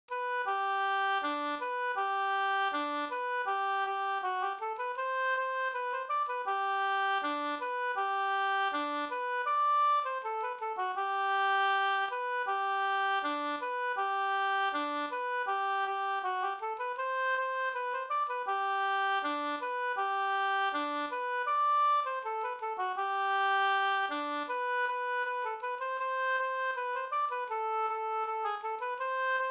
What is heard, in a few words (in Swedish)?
skanklat; Ljuslat; Anders Andersson Lastringe